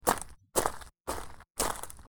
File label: footsteps on gravel; Sound FX